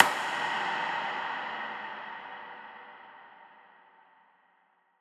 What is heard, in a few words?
Wood Hit